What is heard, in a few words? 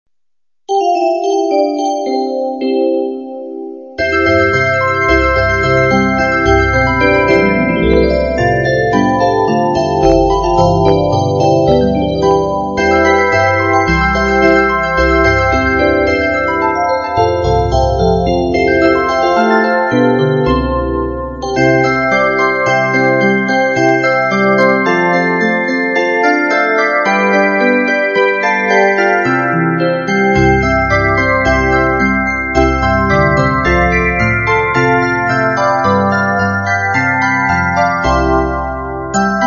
Jingle Bells